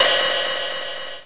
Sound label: open hat; Star Trek JR Audio